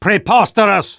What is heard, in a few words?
negative vocalization